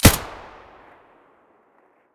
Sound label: aps muzzle stereo; STALKER; IWP